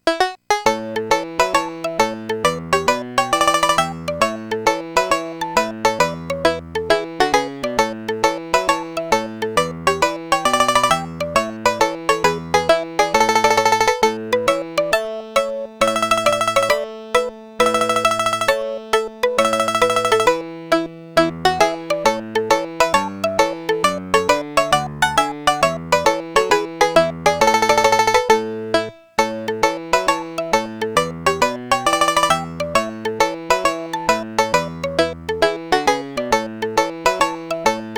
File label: Country Tune; Crystal's Pony Tale; Genesis gamerip